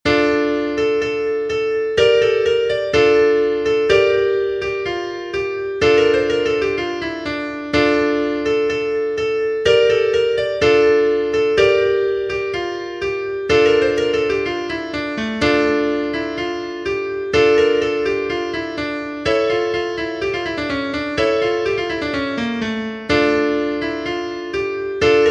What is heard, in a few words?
Klezmer; Terk In AmeriKa; musique traditionnelle